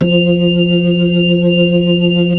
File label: B-3 Chorus